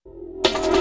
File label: LOOP INTR -L, Drum & Bass, 160 BPM; Ueberschall; Drum & Bass Resonance